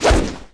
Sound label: Woosh